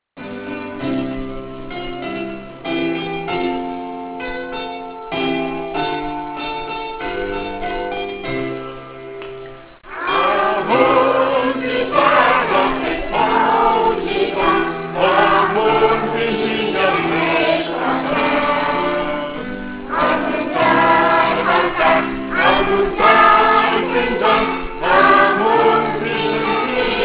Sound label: song; mcmug mcdull